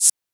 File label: Shaker; FLPRO